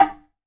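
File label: kotsuzumi; AKAI S6000 CD-ROM - Volume 5; TUZUMI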